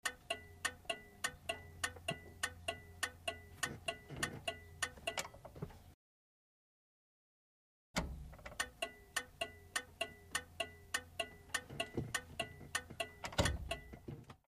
download Sound, Chevrolet Corvair, Interior Pov, Turn Signal.